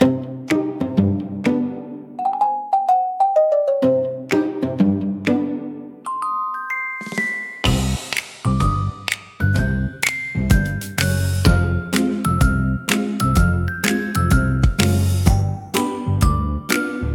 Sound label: Marimba Misstep, comical marimba for funny scenes